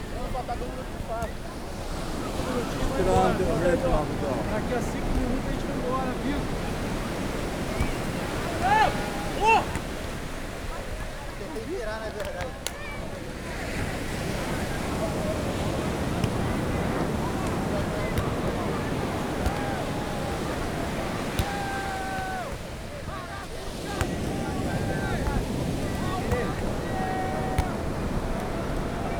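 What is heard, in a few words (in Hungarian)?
copacabana kiabalas roplabda ocean; rio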